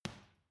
boxing punching bag side hook soft; sport freizeit; boxen

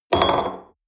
espresso upset table medium, restaurant cafe

